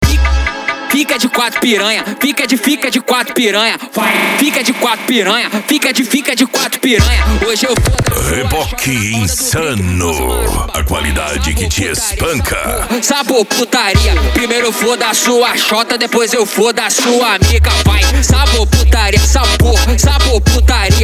noia dance e funk mega bass

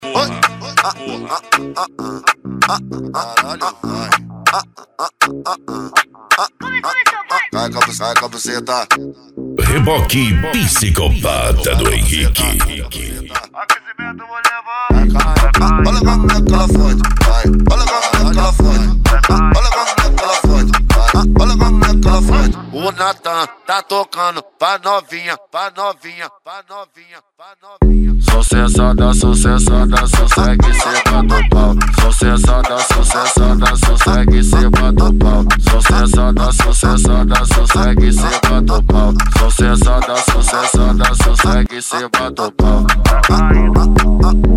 eletro funk

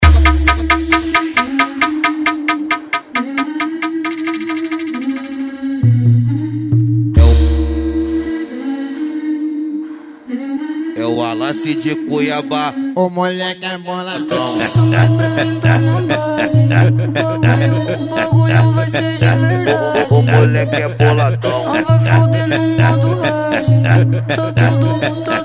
funk ritmado; especial cuiaba

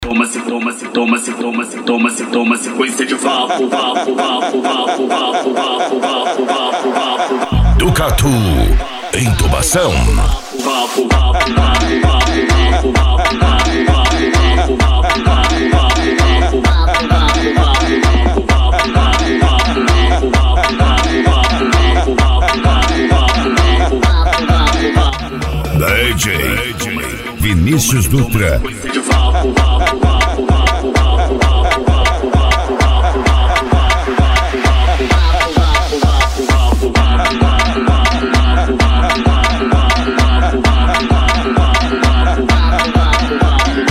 funk agressivo

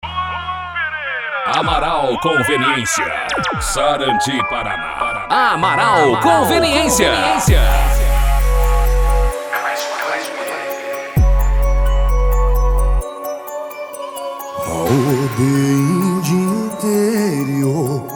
funk e sertanejo; amaral conveniencia; sarandi pr

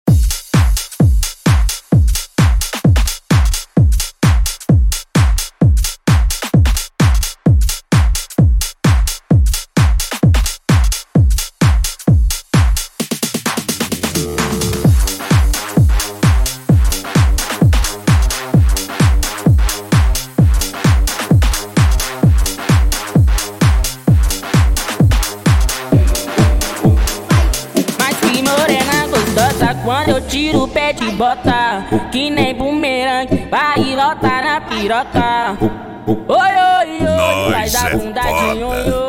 eletrofunk; som automotivo

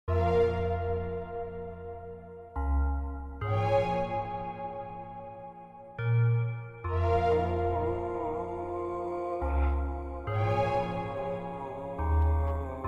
graves bass; blade h2; som de nave

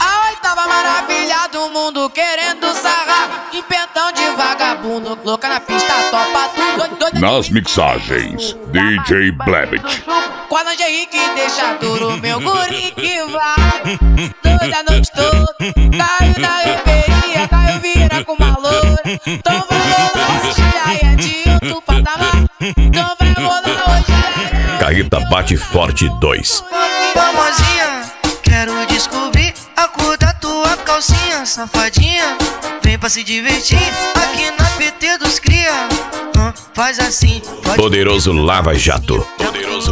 funk sertanejo

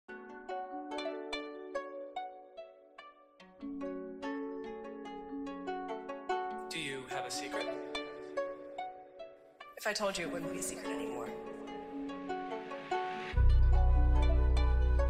graves bass

